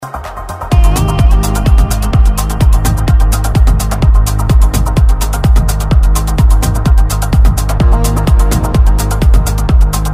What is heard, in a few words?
progressive eletronic music